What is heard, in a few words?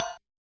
Kit Hat, Realistic Concertmate-670; One Shot Kit